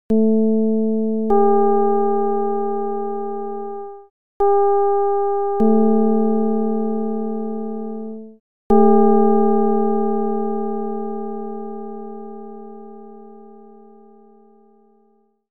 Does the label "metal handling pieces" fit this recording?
No